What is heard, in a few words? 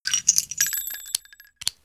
dump and load, magnum; midnight guns